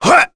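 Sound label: Vox Attack, English; Dimael; kingsraid; voices heroes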